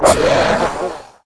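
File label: Lizard Man Attack; Seer; GamigoNA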